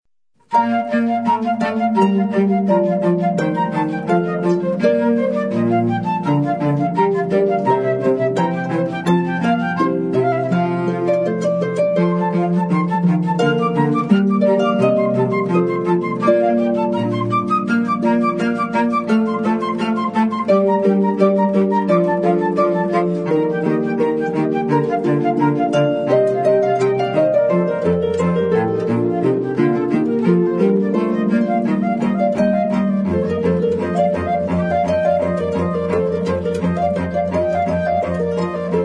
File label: Trio, harp; classical; Handel: Queen of Sheba